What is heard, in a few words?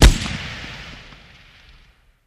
M60 Machine Gun Cal7